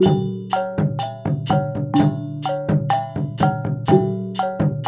WYANG L, Heart of Asia; Spectrasonics - Heart of Asia CD2; W.KULIT

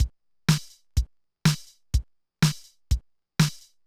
Loop, mxr; classic machines

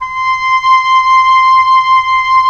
WND OB P, Oboe; Roland - LA Composer vol. 4

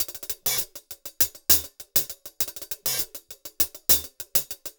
Top Ends; Zero-G; Monster Beats

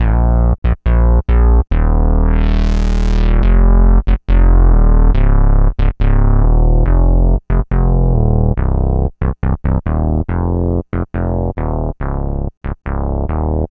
Bass, Loops; Trip-Hop

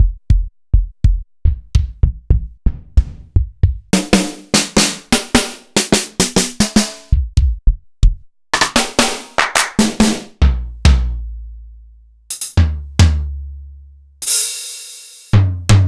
Korg Triton Standard Kit

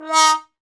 BONE, Trombone; Solo Brass

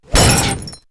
Glass Impact, perc fx; X-Static Goldmine

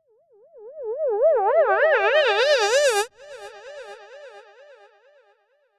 Filter Proc, alarm siren, 130bpm; musicradar

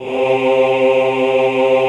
OHH C2, Classical Choir; Best Service; Extended Classical Choir

